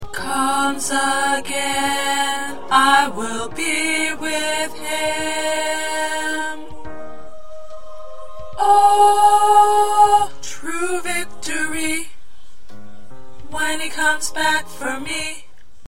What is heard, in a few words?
1st Tenor